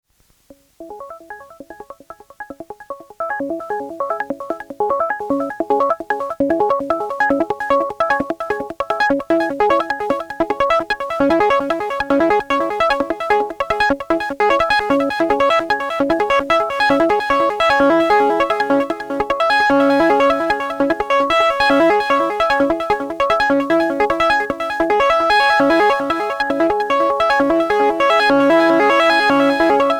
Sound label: Intellijel Cascadia Ringmod